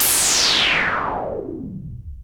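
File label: NOISE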